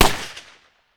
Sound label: M1 rifle, military; Greytsounds VOL-1